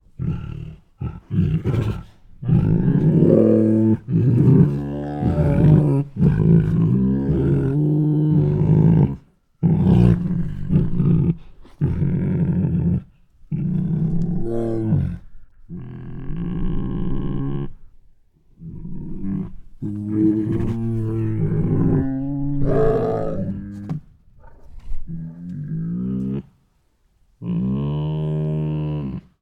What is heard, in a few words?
Two Tigers Make Many Deep Moans; Sound Ideas